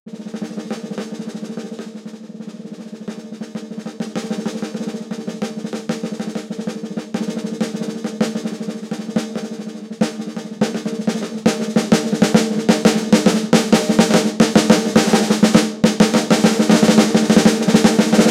a realistic drum roll on; percussion

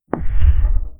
abstract particle burst; particles

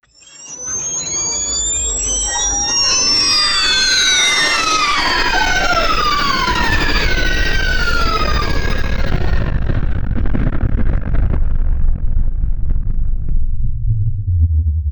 power surge for the sudde, electrical

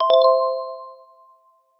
retro game

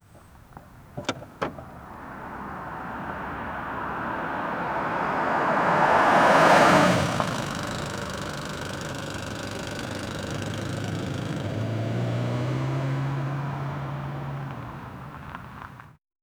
several car on dirt

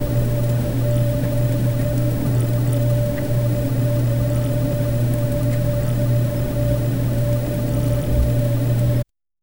a slow drip of water; carpet

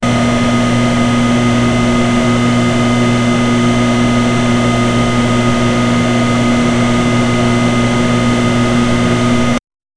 ambient lab equipment noi

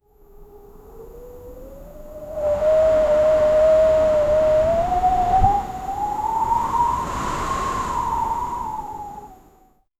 timelaps of wind blowing; time lapse

